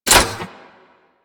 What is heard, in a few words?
Rocket Launcher Fully Charged